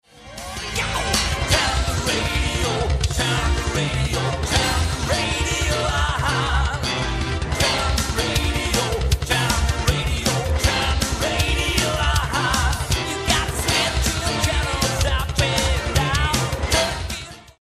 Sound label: bassline